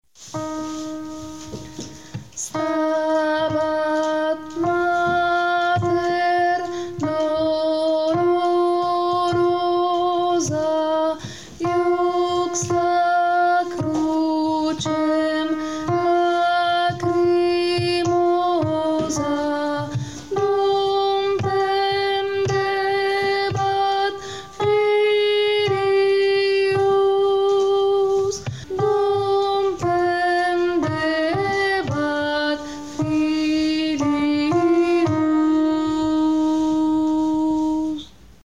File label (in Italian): Stabat Mater contralti; Kodaly